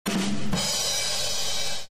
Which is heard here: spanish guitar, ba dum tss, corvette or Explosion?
ba dum tss